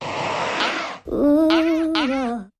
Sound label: R & B Vocal Variation